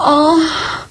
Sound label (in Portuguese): gemido